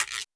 Roland U220 Dry Set

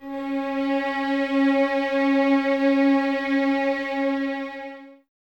Violins Exprsive, String Ensembles; Miroslav Vitous; 11 Violins

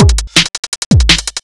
Speed Loop, Drums Beats

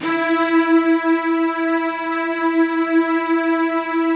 Virtuoso Strings, E3; ILIO; Kirk Hunter Virtuoso Strings VOL-2; 90 s Sample CDs

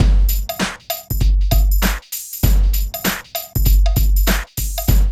DRUM LP; East West - Phat & Phunky

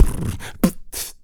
purr putt; Spectrasonics; Planet

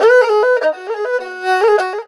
ERHU LICKS

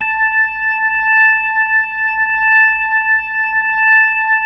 A5S, B3

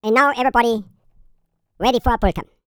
ENGLISH, YODEL YELL, World Voices; ILIO; Vocal Planet

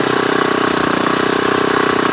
ROTATIN; MACHINES

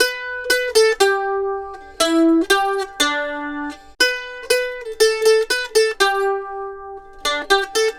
Mandolin Lead; Continental Drift Aftershocks

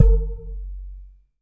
JUG HIT

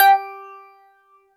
THROATY G4; Synth

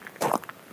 Stony Step, outdoor, Footsteps

